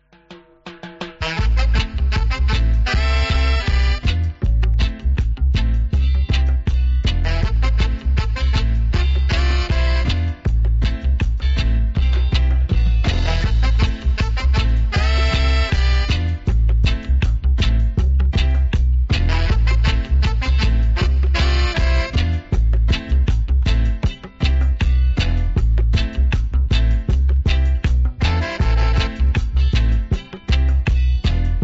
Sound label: Roots Dub